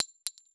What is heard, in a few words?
pistol shell soft, weapons foley; Spearhead Demo; MacFormat 2003 June